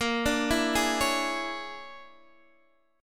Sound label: A sharp 7th Sharp 9th Flat 5th A sharp down Guitar Open D